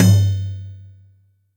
Tine Drum; Yamaha DTXpress; Effect 1

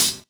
Hhat, HiHat; Equipped Music; Loungin House

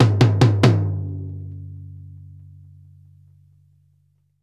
8THS RACK, TOMS; Sampleheads; New York City Drumworks VOL-1; SP TOMS

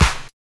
combi drums

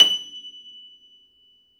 piano F5, Classical Piano ff; Classical Piano ff -ped; Total Piano; Brilliand Classical Piano

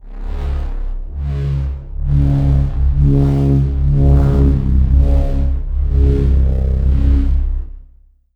TEX Analog Filterscape; Polyend; Plughugger

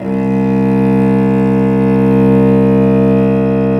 STR VC Arco Solo p

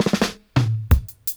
88 FILL, Dub, DRUM LOOPS, 088 BPM; Drumdrops In Dub VOL-1

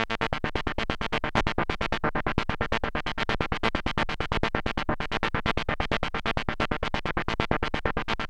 LFO 125, SYNTH SEQ; AMG; Global Trance Mission VOL-2

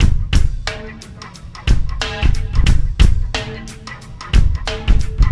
Landing Party Beat, Processed Drumkits

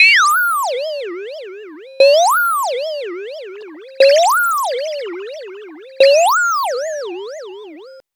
Syrinx, L; AMG - Vince Clarke Lucky Bastard

